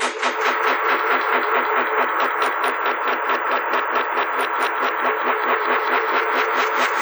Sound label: delay line; Sonic Foundry (Sony Creative Software); Ugly Remnants VOL-2; UR 2 FX (Long)